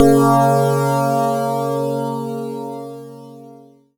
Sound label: C#, BELL GONG, Synclav FM; Big Fish Audio